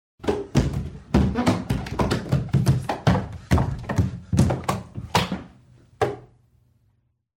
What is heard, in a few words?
Body Falling Downstairs; SOUND EFFECTS1